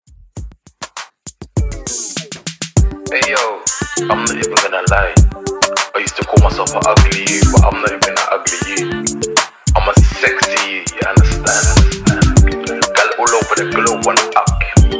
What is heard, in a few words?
Top40 Drum ReFresh In Outro 100 BPM